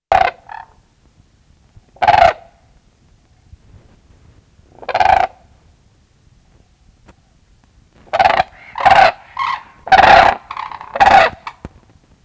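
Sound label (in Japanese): mana zuru, マナヅル 鳴き声; 上野動物園; 中国北部とモンゴルで繁殖し、中国東部や朝鮮半島、日本にわたって越冬します。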